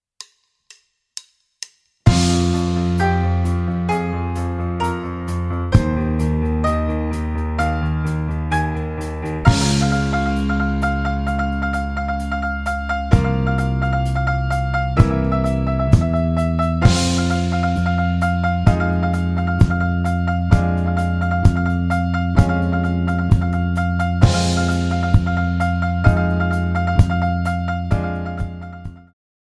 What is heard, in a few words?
130BPM Fm